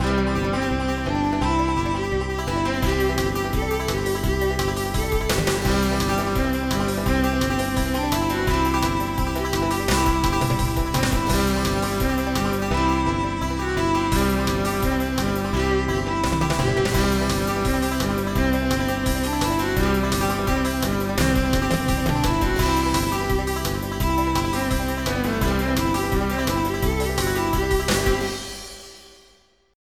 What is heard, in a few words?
bluegr; vyper